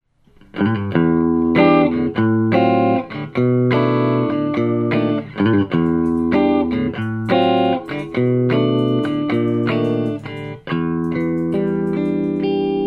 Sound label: Guitar Vintage